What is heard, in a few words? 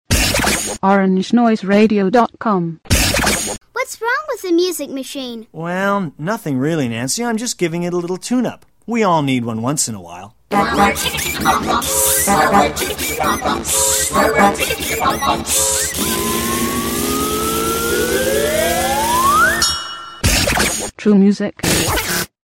bumper